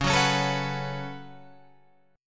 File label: Db 7th Suspended 4th Sharp 5th Db Guitar Open F; strummed